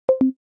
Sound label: facetime imessage leave; Системные звуки и уведомления iOS 18 (iPhone 16, 16pro, iPad)